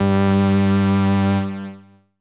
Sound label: saw filter lfo 2osc fx